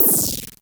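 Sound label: Klone Dual Percussion Synthesiser noise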